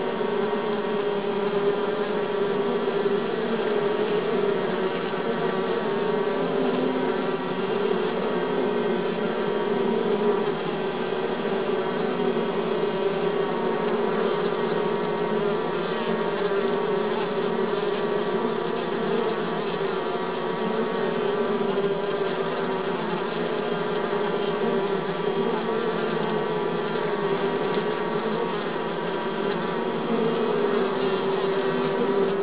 swarm

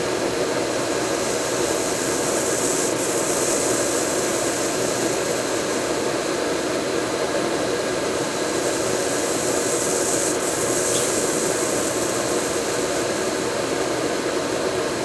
ambience

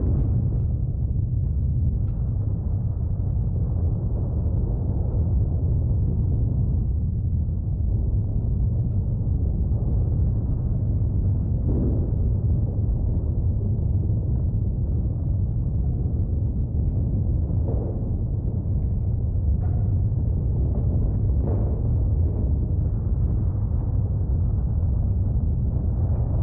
ambience large

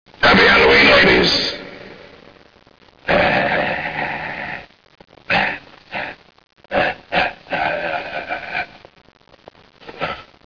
halloween

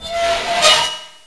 creak, ambience

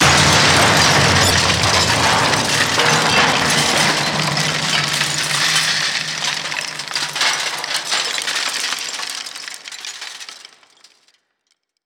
metal break

